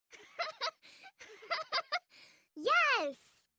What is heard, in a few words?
Cheer; sister